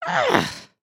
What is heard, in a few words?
indignant, emotes